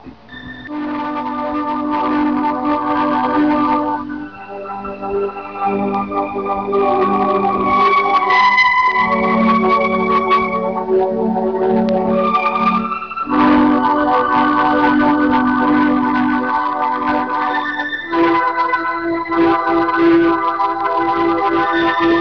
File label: piano